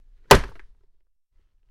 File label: Football Helmet Pla, football